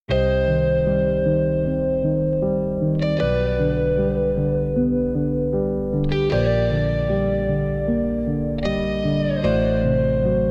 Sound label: epic rock, instrumental